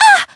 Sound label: damaged; lele